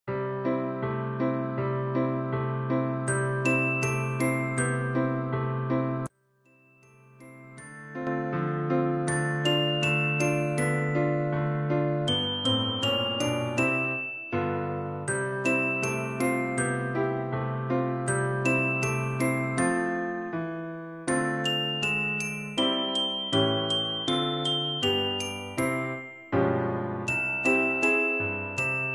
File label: jingle bells; pierpont james